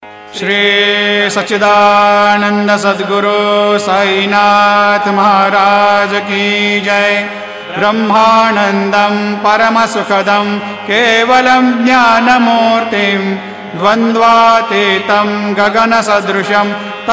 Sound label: ringtone; sri sai baba